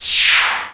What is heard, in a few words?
Magic SPASMWH